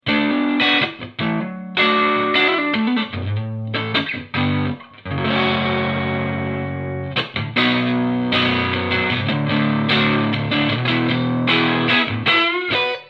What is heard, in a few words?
overdriven